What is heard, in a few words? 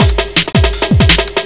Speed Loop, Drums Beats